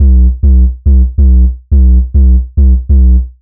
140 B BASSLINE, Dubstep; Loopmasters; The New Sound Of Dubstep; BASS LOOPS

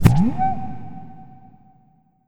FX Impact

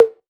Percussive

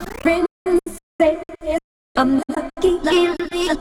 126 FEbCEbF VOCAL LOOP, Funky Underground; Loopmasters; Funky Underground Vol 2

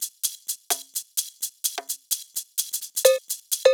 Loop, 128 BPM

